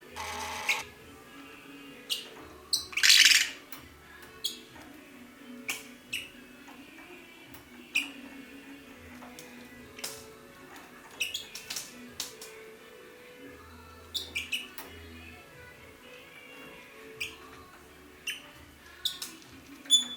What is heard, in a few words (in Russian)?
pevcii popugai; psephotus haematonotus